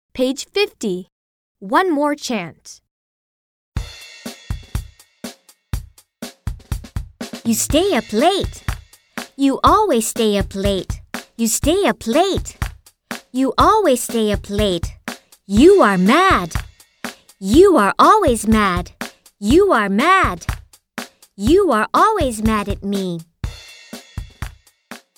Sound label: One more chant